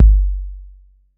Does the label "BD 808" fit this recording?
Yes